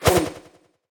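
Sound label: attack; En boardwalk atk; Fight Knight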